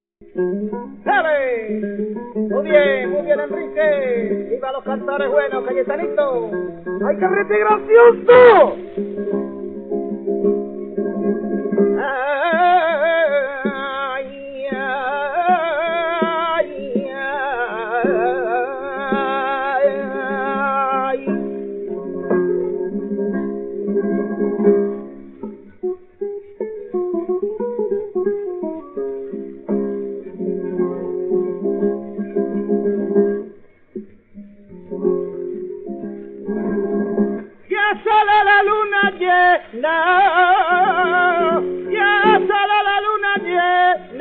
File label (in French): soleá del Mellizo, chant, guitare; "Ya sale la luna llena... "